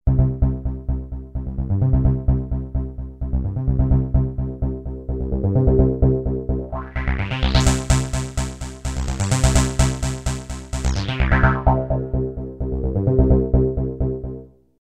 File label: bass, Yamaha CS1x CS2x